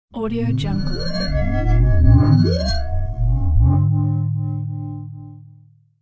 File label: horror transition